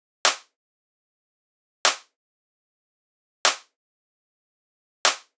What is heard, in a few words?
Dubstep Drum Loop Snare, 150bpm; Full Drum Loops (Stem Seperated); Ghosthack; Dubstep Sound Arsenal